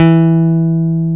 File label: E 2, PICK BASS; AKAI S-Series CD-ROM Sound Library VOL-7